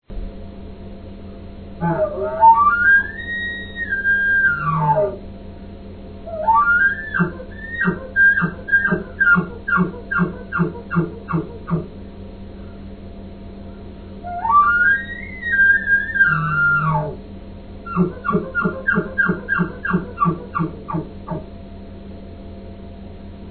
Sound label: bull, elk; elk 2001